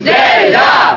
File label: Cheer German; Zelda; SSBB